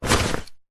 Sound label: foley luggage rucksack backpack pick up from concrete